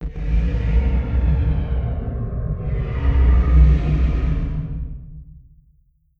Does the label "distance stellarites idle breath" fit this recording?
Yes